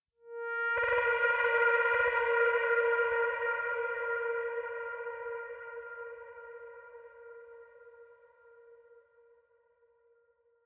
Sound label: Howl; Martian Chess